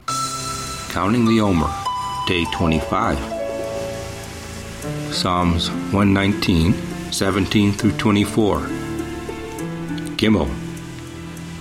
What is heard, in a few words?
Meditative w Waves Ocean; Omer; Psa119